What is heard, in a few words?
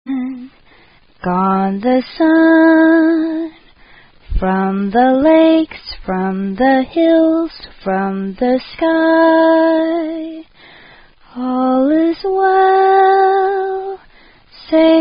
Taps